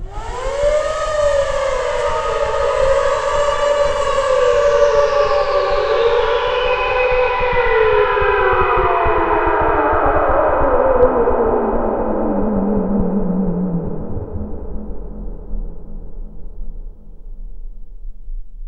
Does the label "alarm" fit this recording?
Yes